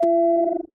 spider sense close warn; burncard; Titanfall 2

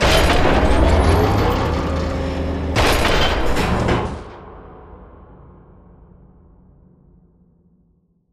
Vertical Elevator Sequence; Beacon; Titanfall 2

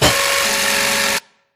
Screen Glitch Long; Boomtown; Titanfall 2

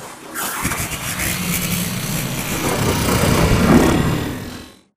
spectre 3d printing; Titanfall 2

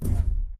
footstep jog; Titanfall 2

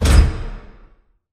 sliding door sm verb close stop; beacon; Titanfall 2

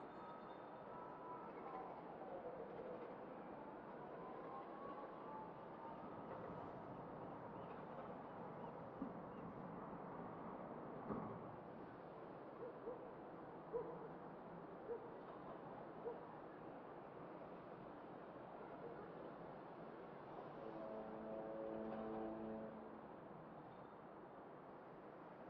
amb int small room; angel city; Titanfall 2

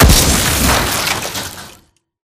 bullet generic exploding head kill shot android; Titanfall 2

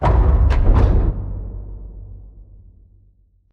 Giant Pillar Distant Start; Titanfall 2; Boomtown

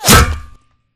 Imp Bullet Light Ballistic Solid Metal

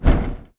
bt footstep jog parts; Titanfall 2

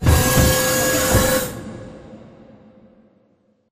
Giant Pillar Pods Move; Titanfall 2; Boomtown

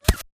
imp bullet lightballistic impactsweetener; Titanfall 2

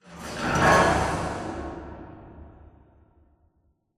Robot Arm Track Speed Change Dist; Boomtown; Titanfall 2

